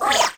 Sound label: hit; HEDZReloaded